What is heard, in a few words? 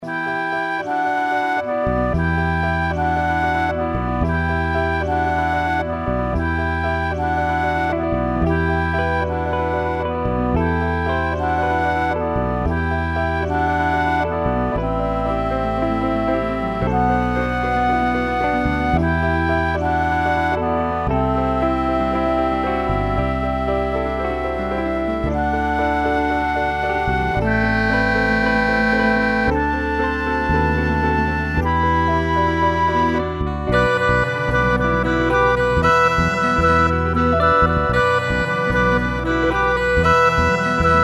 o come o come emmanuel woodwind and sax